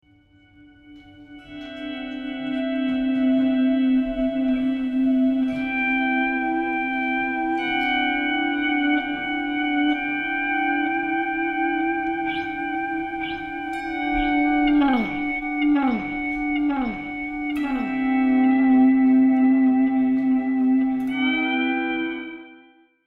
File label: Volume Swells; Behind The Scenes